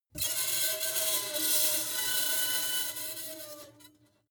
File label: metal screech phaser edgy